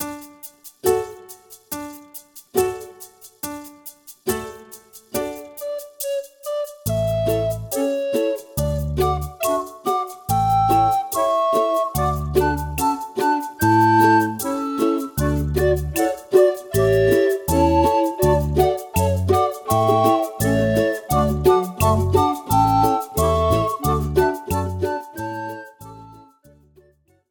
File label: Accelerando